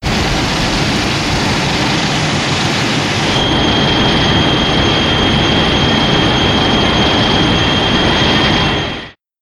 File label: Thermal Flame to Heat Ray; Shin Godzilla